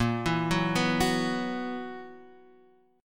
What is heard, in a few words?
B Major 7th Suspended 4th A sharp